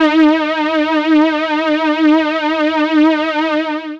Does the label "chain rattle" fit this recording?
No